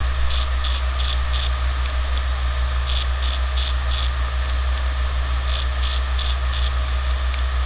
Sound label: IBM Death Grind; Smashed and Bashed